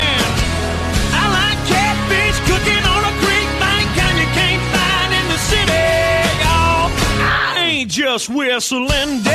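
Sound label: whistling; Country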